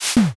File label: spear